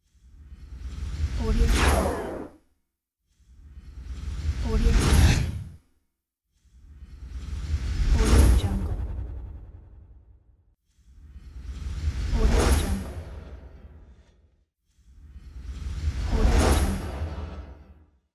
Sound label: swoosh